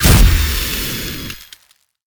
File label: weapon staff frost orb